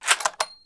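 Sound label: desert eagle reload, magazine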